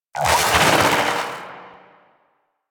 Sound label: Vocal Pain; Warframe; Sentients; Conculyst